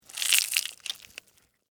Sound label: Tear Rip Green Pepper, Texture, Tear, Rip, Green, Pepper, Skin, Vegetable; BlastWave